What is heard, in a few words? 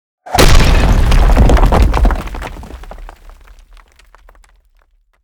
stone explosion sound; Pygame Project